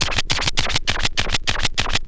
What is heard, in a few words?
scratch short, Vinyl scratches